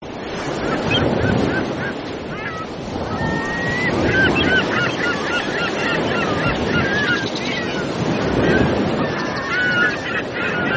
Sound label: surf gull